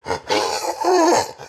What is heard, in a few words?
Male Death; Project Zomboid v41.73